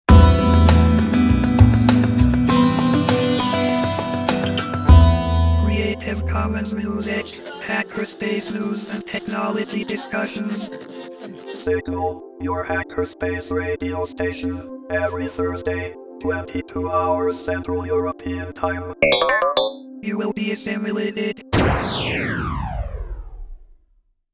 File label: Signal - generic jingle